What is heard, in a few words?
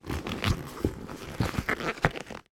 foley rubber object movement; sound effects one